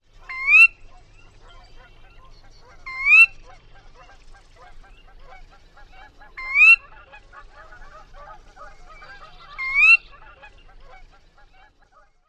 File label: ker-wee, Sora